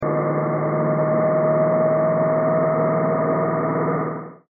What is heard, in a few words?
Axle Whine